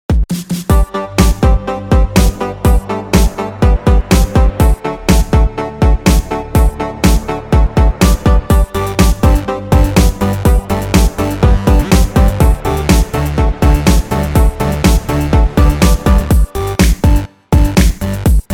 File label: Music Celebration; Amway